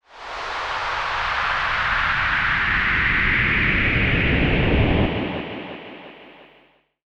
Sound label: Virus Noise